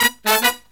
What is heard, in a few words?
Killer Brass Riffs, 108 soul